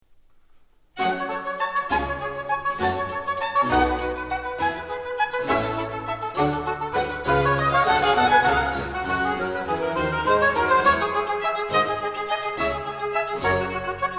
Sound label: Concerto in G Minor for 2 Oboes and Bassoon Allegro; TWV 53 g1